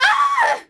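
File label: Horse Archer Die Vox; Civ4 warlords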